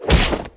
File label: Attack Fist